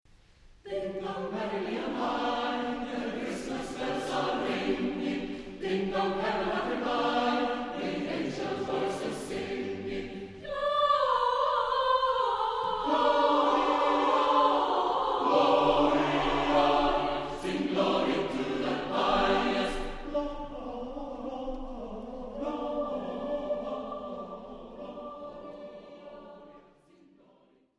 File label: Ding dong